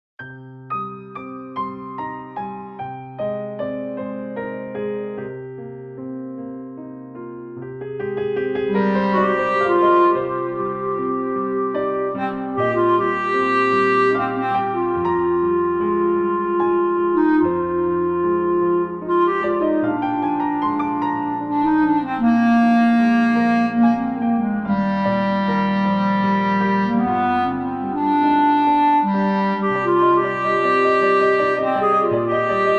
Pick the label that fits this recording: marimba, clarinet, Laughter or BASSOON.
clarinet